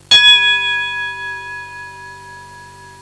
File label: fight bell, percussion instruments; Sound Effects for Multimedia - Volume 1